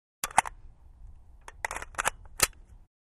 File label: Insert Magazine Ak47